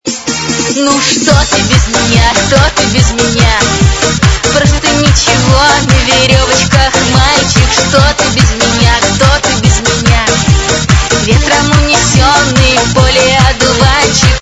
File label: ringtone